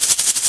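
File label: shake loop; Shadowman; weapons; asson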